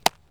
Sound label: glove, baseball